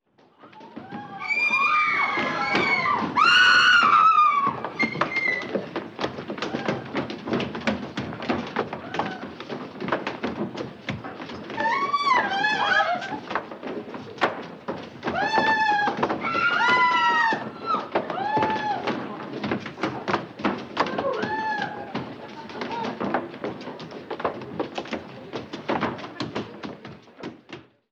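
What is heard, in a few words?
women panicking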